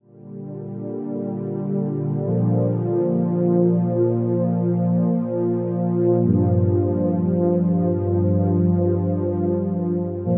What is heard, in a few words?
Akai S3000XL Pad